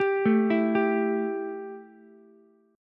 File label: E Minor Double Flat 5th, Ukulele; 12,9,12,10; arpeggiated; JGuitar